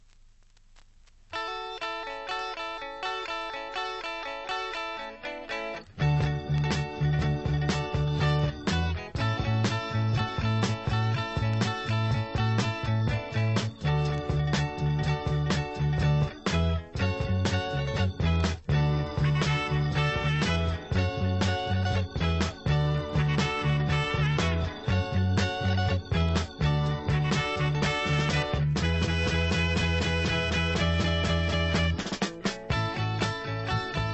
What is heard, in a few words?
funky funk